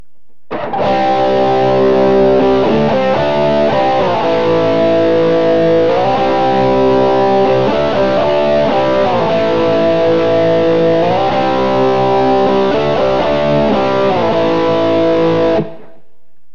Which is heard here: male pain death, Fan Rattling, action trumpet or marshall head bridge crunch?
marshall head bridge crunch